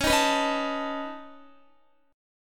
C sharp 7th Suspended 2nd Sharp 5th, C sharp, down, Guitar; C#7sus2#5 strummed